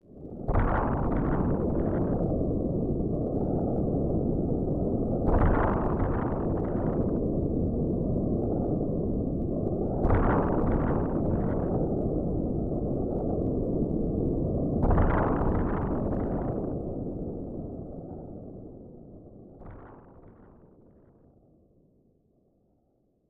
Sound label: Wind and Thunder; Madara 2